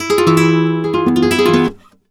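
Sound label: arpeggio guitar, Flamenco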